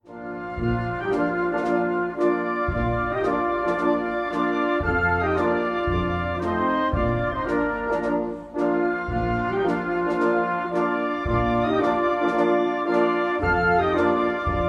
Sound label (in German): nationalhymne italien ringtone